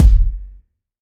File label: warm steel kick drum g key